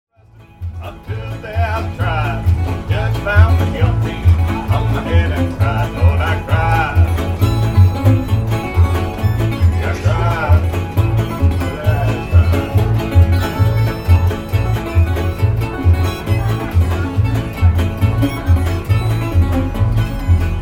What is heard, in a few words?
Street Musicians